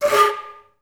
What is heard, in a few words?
FL FX, Advanced Orchestra